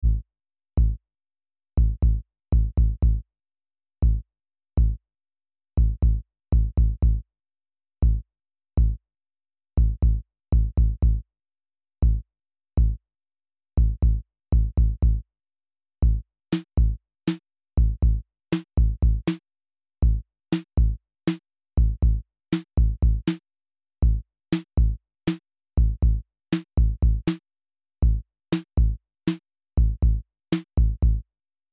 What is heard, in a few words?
drums